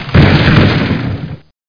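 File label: leaf break; Hexen2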